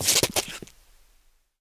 shoe scuff, footsteps; survivor

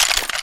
grenade launcher latch open; weapons

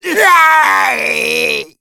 smoker spot prey

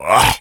shoved, infected

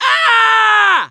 hurt critical, mechanic

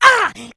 rage, infected, female

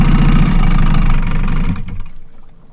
river barge stop

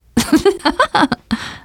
laughter, teen girl

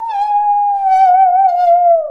saw danger, music, zombat

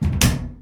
door metal medium close; doors

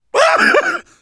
laughter, survivor voice

